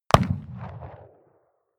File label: revolver shot 44cal remington 25m rear